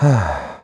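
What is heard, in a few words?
Vox Sigh, Korean; Riheet; kingsraid; voices heroes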